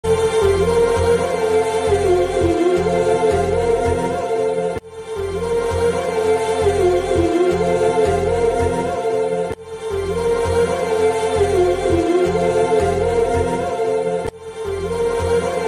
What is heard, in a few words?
ringtone music instrumental background; genius